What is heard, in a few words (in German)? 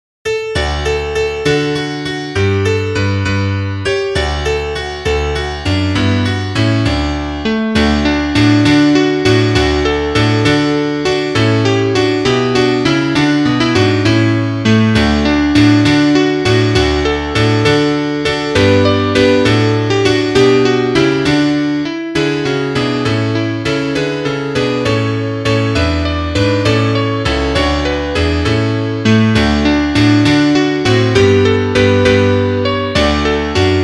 Klavier Solo, trompete; Rondo